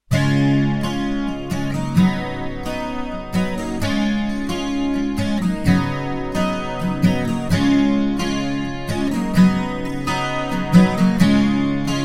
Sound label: Acoustic Guitar Wet